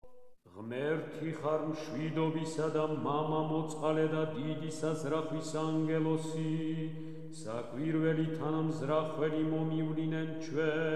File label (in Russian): irmos iz kanona; Rozhdestvu Hristovu